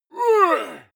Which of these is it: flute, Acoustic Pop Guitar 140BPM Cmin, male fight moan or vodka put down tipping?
male fight moan